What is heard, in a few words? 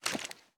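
pistol 1911 inspect; gmod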